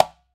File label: QUINTO, Conga Battery